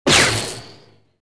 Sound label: green lightning